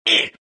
pain; tinker

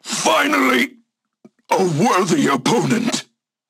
Death; Paladins; Fernando

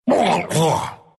pain, ru; dota2

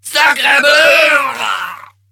death

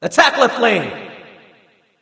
Attack; Critical.DMG Ne Zha

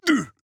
Grunt Hit; Paladins; Koga

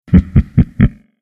earthshaker laugh

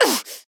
Grunt Hit; paladins; Evie; Troublemaker

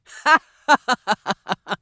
Assassin Laugh; Shinobi; Realm Royale

